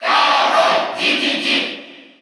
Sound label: Cheer Russian; King Dedede; SSBU